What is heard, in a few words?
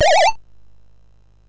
sid MOS6581R2; Popeye